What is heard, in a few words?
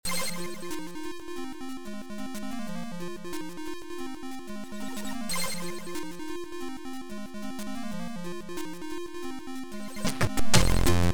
grooves